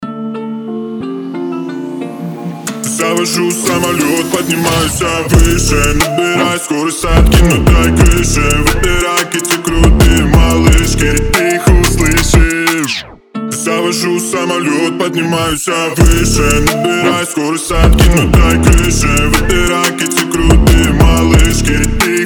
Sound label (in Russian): рингтон